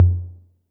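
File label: Roland808 low tom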